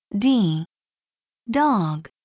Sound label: dog